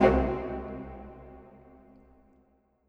Woods Low Hit

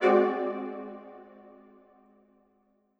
Brass High Hit

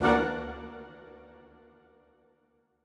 Woodwind Ensemble Hit

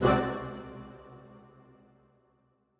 Woodwind Ensemble Hit

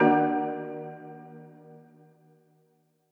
Marimba Hit

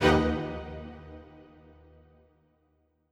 Strings Hit Spiccato

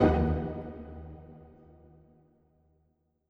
Strings Hit Pizzicato

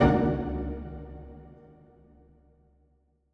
Strings Hit Pizzicato

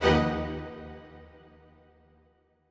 Strings Hit Staccato

